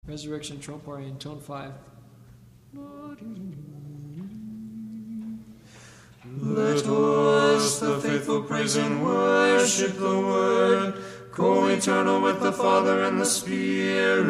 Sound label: Tropar Tone 5